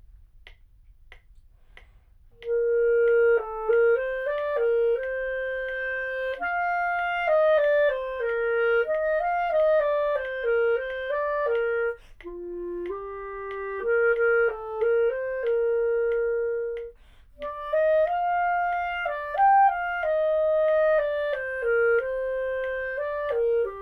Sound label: Carol from the Five Baggatelles with metronome